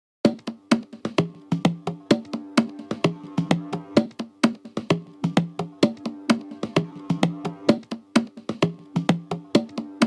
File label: Latin Tribal House mix